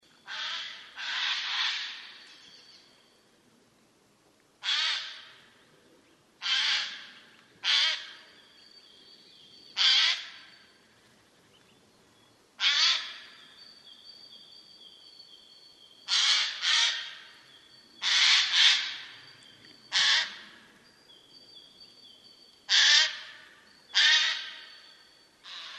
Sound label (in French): geai